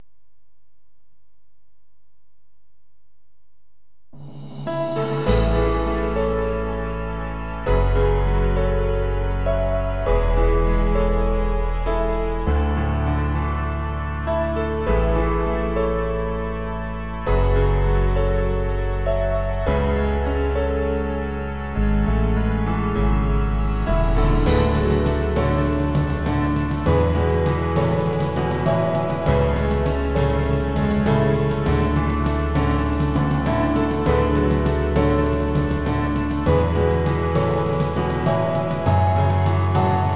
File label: guardian piano